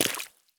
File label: run puddle; Escape from Tarkov